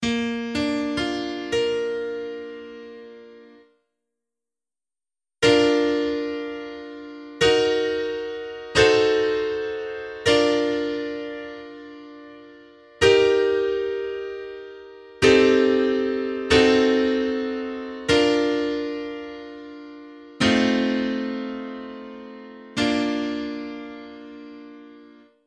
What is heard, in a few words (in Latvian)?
akordu sec; AKORDU SECĪBA